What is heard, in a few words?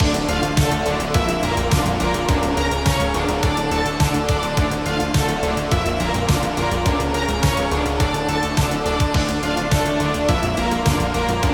Song Loop; Endocrisis